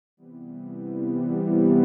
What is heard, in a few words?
house synth 125 A, keys